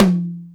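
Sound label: Tom Hi Slam, Alesis D4 drum machine; 300 Drum Machines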